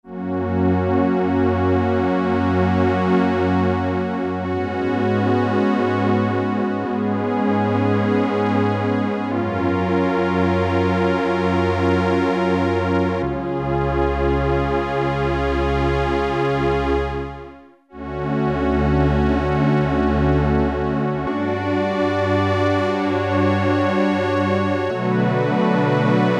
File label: STRING ENSEMBLE, Oberheim OB-8; Factory Patches